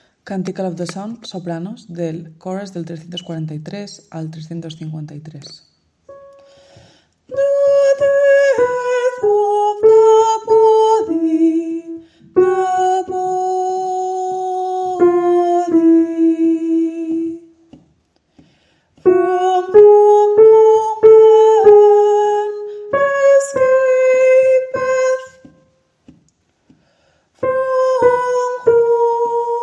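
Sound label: soprano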